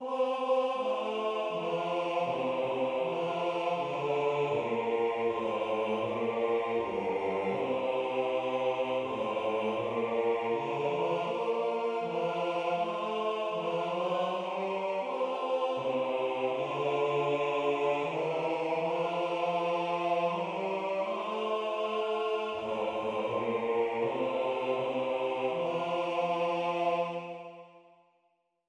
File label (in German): Kein Feuer, keine Kohle Bass